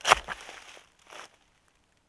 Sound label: Shovel Dirt; GrandFantasia